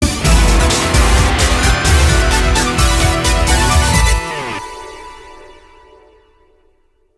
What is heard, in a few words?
Jingle Lose; Kamen Rider Dragon Knight; Wii